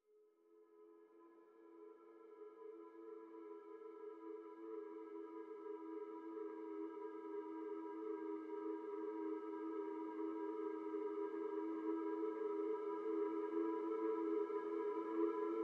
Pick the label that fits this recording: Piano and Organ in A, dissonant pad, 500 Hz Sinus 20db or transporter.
dissonant pad